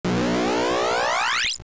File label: Barrier, barrier dispelled; Great Temple's barrier dispelled; AOL